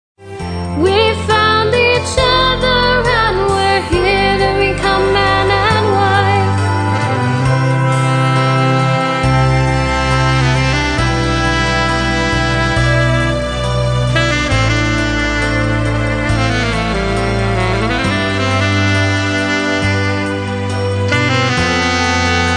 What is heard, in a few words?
Sax Solo